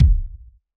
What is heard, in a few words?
house kit kick